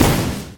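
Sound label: double jump explosion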